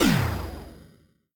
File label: spell general; Crypt of the NecroDancer